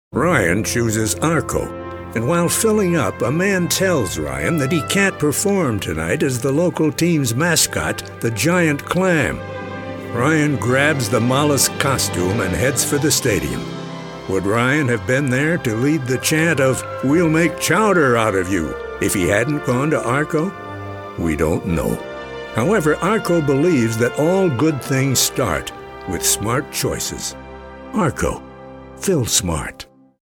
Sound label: arco giant clam